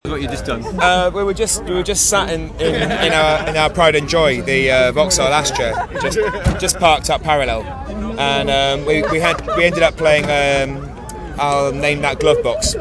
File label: glove box